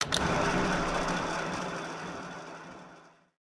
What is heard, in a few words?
spin; Book Of Pharao